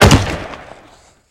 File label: Hockey Player Body, hockey